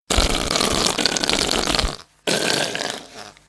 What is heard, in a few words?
Whoopey Sms Ringtone